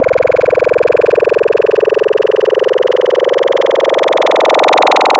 Growl Table, Serum; Cymatics

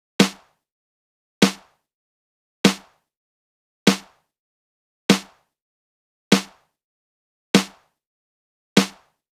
Snare, Drum Tracks, Amin; Hip Hop; big fish audio; Hip Hop Exotica; Apple Loops

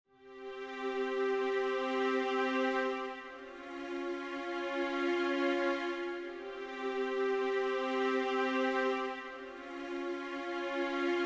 Strings, 080 Cmin, Hip Hop Exotica; Big Fish Audio

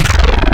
Bass Shot, Growl Wavetables; Cymatics; Virus Wavetables; Serum

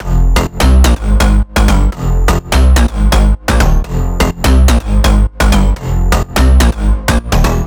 Big EDM Bass Loop F1; Future House; Future House Tools; Bass Loops

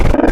Goose, Animals Wavetables; Cymatics; Serum; Animals for Serum - Gold Edition

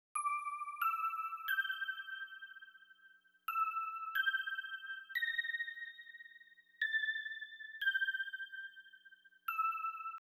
Electric Piano, 090 Emin, Hip Hop

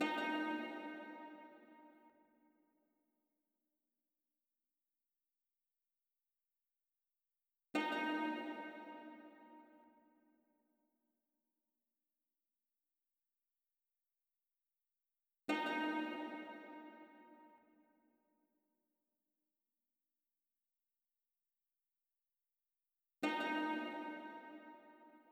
Mount Fuji 124 BPM C Min Lyra; Cymatics; Destiny Gold Expansion

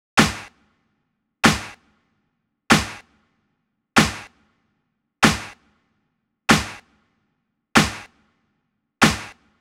Snare; Hip Hop Exotica; big fish audio; Apple Loops; Drum Tracks

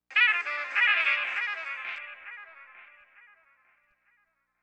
Stab; Thirty-One Flavors

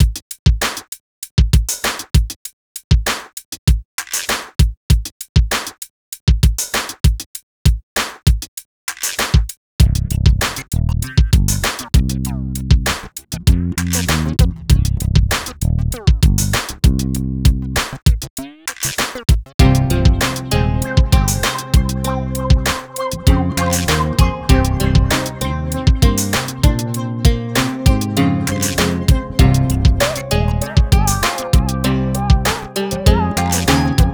098 Cmin, Hip Hop Exotica; Apple Loops; Big Fish Audio

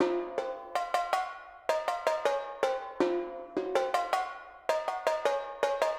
Timpanis, 080 Fmin; Apple Loops; Big Fish Audio

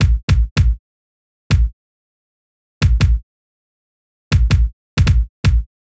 Kick, 080 Fmin, Drum Tracks; Hip Hop; big fish audio; Hip Hop Exotica; Apple Loops

